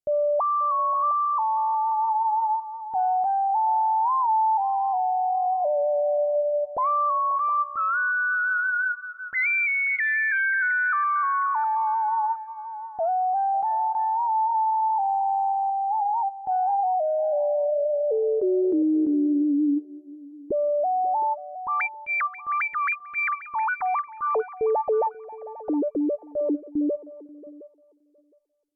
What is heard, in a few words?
Simple Sine